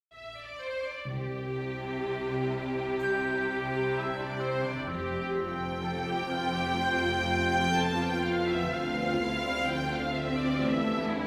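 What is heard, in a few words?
Wind Strings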